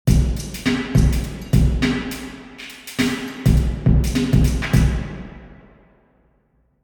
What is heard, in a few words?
typing drum, room; terrys; omni